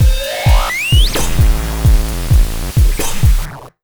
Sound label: JUMP 130, Big Beat Drumloops